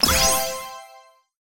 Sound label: positive affirmation